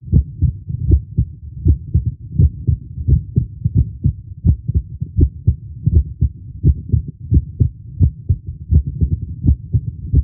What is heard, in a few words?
theme; ggj13; sigurdrshavoc